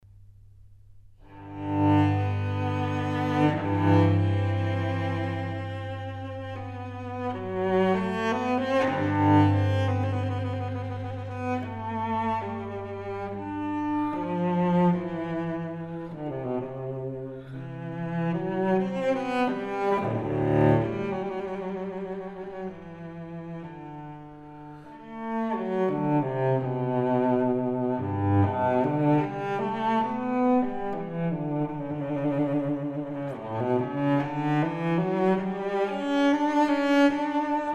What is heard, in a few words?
Suite for solo cello No. 1 in G; Bach; Cello Suites